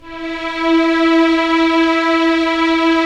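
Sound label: Strings Violins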